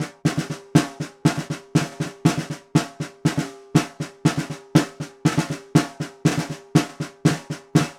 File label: Vintage Ludwig Snare, Percussion; Continental Drift Aftershocks